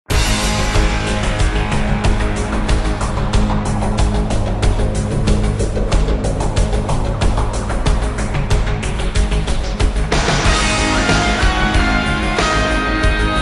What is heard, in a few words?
Theme Slow Reverb; GTA VCS